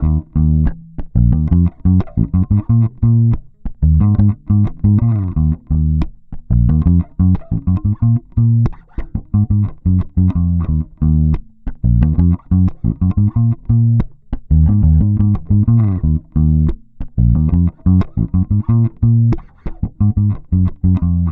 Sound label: Bass loop 90 Eb, Electric Bass; Loops; Stingray; Slate Digital BASSHOLE Sample Pack